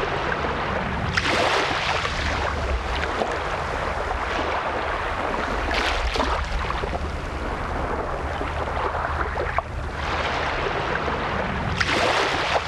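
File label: ambience lagoon water lap shore; Titanfall 2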